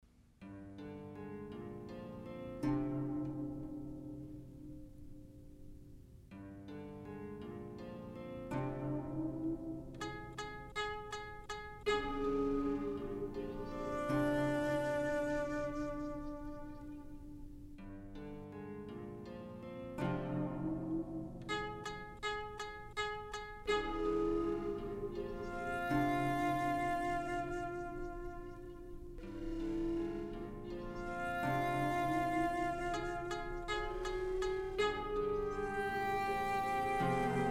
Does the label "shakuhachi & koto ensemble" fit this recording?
Yes